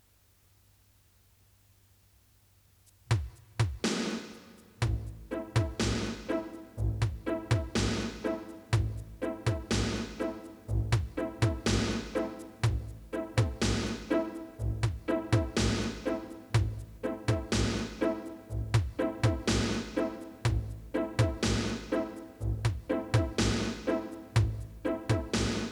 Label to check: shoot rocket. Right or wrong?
Wrong